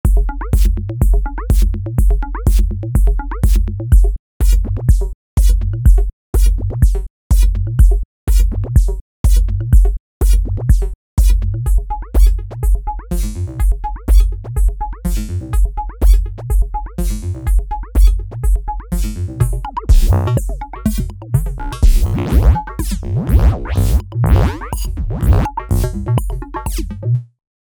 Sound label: Flow on Minimal Beat